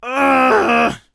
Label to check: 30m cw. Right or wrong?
Wrong